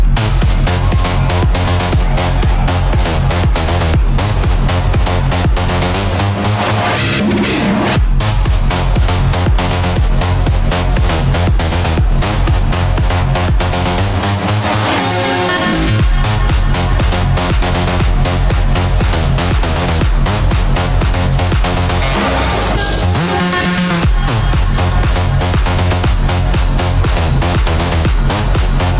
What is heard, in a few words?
club beats